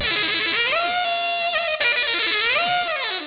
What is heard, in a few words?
trumpet; jazz club